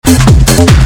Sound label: Loop